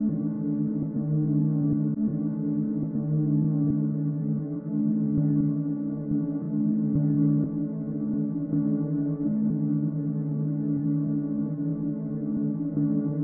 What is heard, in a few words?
music